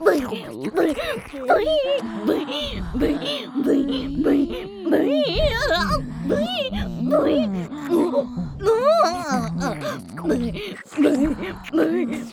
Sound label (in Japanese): ブヒ声喘ぎ＋ブタ媚び耳舐め